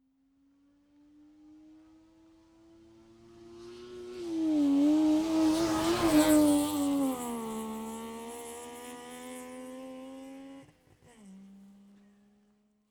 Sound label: car saab 92 exterior pass right to left